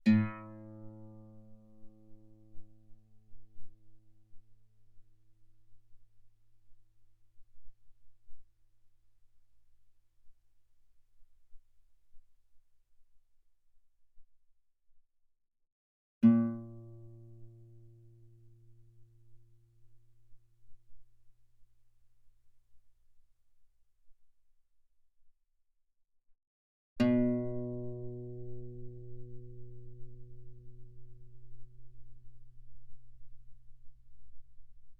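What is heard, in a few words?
Guitar fortissimo sul A A2 B2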